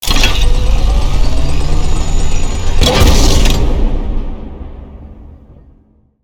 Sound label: shoot; Tacz1.1.5+; RSWS 1903